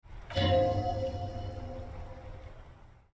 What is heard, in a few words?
psyche skill theme Klang